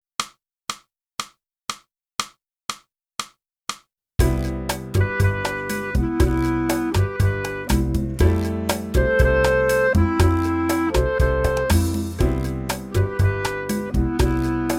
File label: clarinet